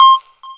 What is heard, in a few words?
Rhodes Hi Note; Zero-G Jungle Warfare 1, 2 & 3; 90 s Sample CDs